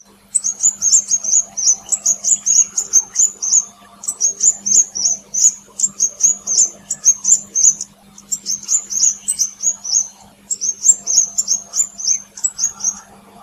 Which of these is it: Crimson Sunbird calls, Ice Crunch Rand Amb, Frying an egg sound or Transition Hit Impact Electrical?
Crimson Sunbird calls